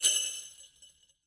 spin; santa vs rudolf

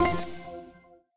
spin Button Click; twin happiness

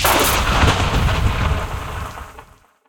Splash Thunder; wildworlds

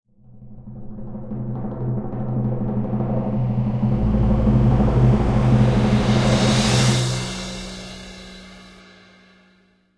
pre respin; druids dream

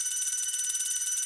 count Up; hellskitchen

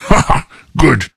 candle Goes Off Screen; rage of the seas

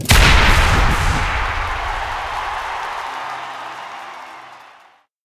big Win Start

